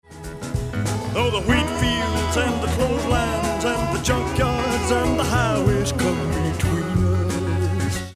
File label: gentle harmony